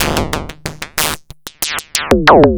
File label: FM Perc Loop, Analog Glitch; Polyend; Stazma & Concrete Collage